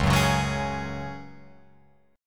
Db Suspended 4th Db down Guitar Open F, Dbsus4 chord; strummed